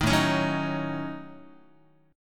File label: A 6th Flat 5th A Guitar, chord; A6b5 strummed; 6,5,4,4,7,x; 4A,4E,5A,5D,5G#,6C#